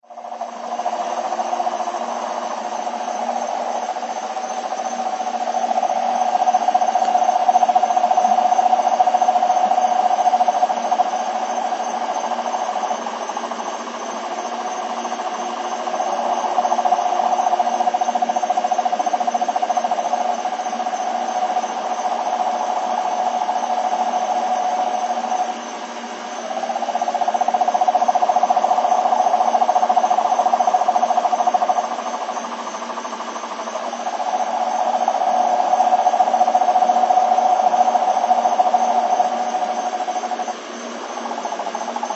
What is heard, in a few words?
marine cane toads, south FL